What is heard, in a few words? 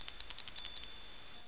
tinkle